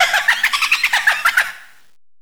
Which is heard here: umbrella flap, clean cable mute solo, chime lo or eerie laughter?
eerie laughter